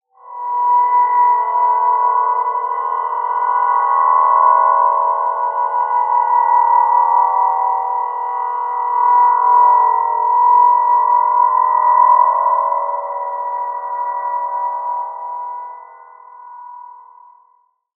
B5 f; healing soundscapes; Ableton

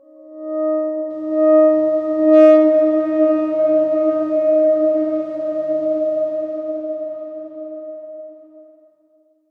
Darkswarm D#3 f; healing soundscapes; Ableton

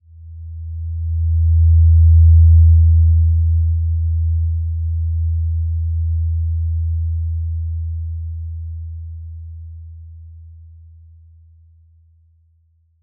Simple Glow E5 f; HSS-Custom-1; healing soundscapes

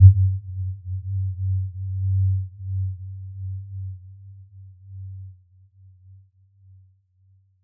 Warm Bounce G2; healing soundscapes

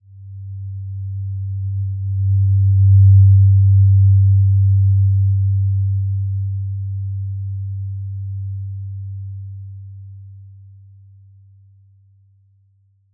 Simple Glow G2 f; HSS-Custom-1; healing soundscapes

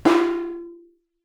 timp snare mf; Percussion; HSS OP Pack; healing soundscapes

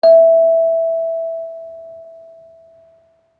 kalimba circleskin E4 pp; Acoustic; HSS Acoustic Pack1

